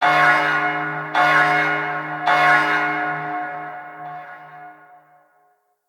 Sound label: Alarm Distant; Missions; Venus; Orb Heist; Bounty Two